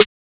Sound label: snare; maily plugnb; fl studio; drumkits